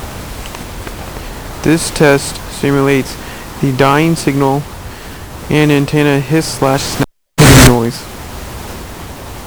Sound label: Fading Mic, Fading with Milli Mic